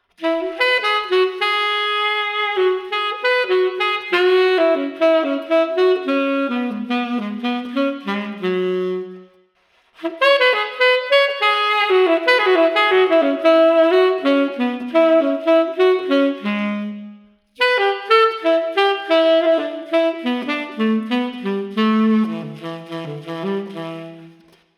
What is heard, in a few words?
Tenor Sax Happy 90